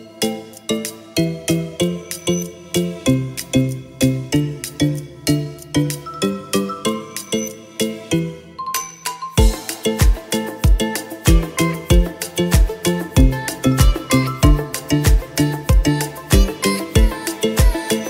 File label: Ringtone Song; Vivo